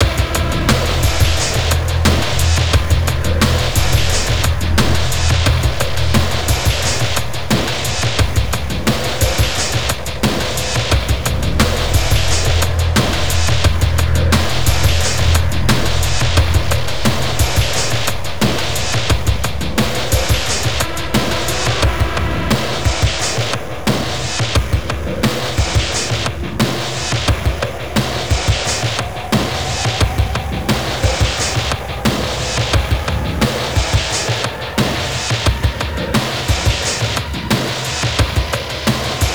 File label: Industrial; Industrial Music Pack